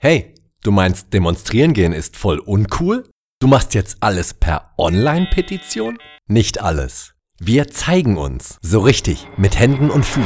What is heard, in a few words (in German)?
Jingle; Protesttag am 04 Mai